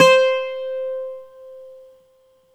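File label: bouzouki piano C 3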